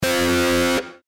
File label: fire guitar; Adventure Time - Elemental; ThrillGames